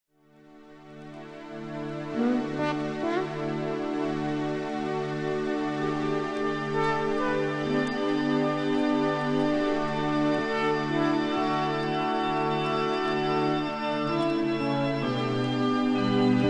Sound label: minimoog